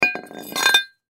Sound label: Crowbar Pick Up Scrape Cement; Hand Tool; Industry; Tools